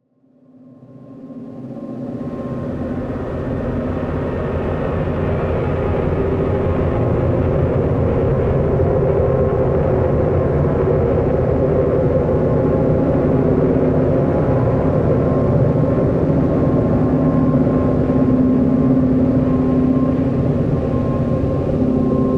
Drone Airy Cavern; Drones

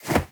CLOTHING MATERIAL MOVEMENT; Hit & Punch; Shapeforms

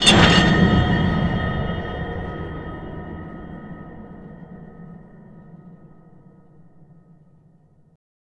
Hit Impact Metal; OPEN CONTRACT; MatiasMacSD

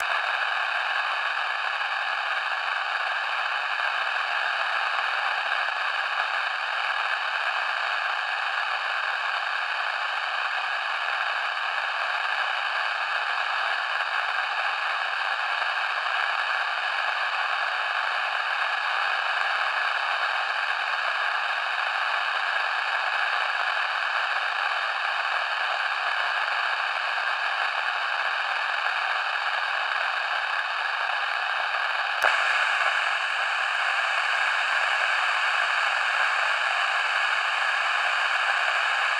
CB Radio Starting Up; Soundopolis

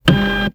Scan; Membrans; Scanners Sound Pack 01

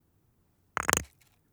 Rock Quick Drag On Rock; Wav Junction Sound Effects; Rocks

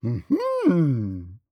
EMOTE Man, Curiosity; Universal Emotes; Articulated Sounds

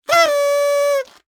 Party Pack Horn Coil Short; InspectorJ

